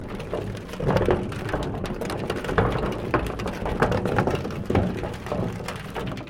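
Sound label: sounds of barrel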